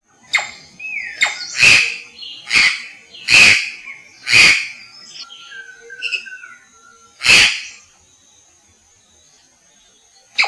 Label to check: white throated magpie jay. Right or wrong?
Right